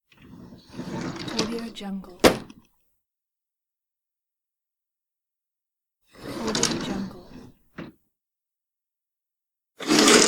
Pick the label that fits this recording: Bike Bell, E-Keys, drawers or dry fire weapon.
drawers